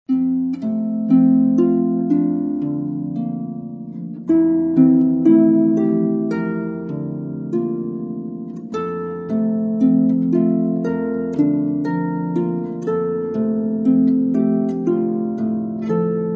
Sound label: Harp Hymns